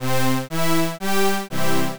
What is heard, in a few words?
orchestra